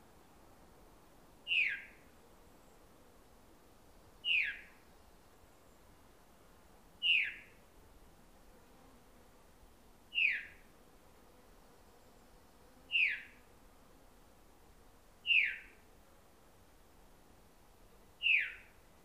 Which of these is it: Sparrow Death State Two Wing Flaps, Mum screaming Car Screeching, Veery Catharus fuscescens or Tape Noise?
Veery Catharus fuscescens